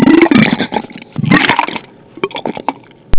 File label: vomiting